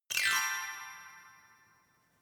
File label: notification glockenspiel sparkle slide down hard